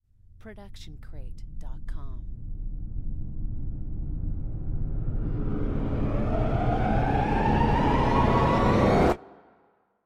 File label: horrific riser; soundscrate